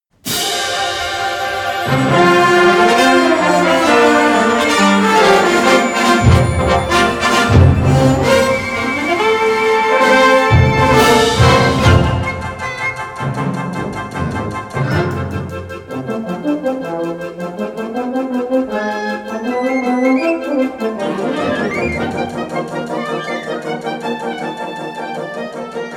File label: ouverture